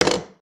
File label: wood misc, wood metal; wood metal SFX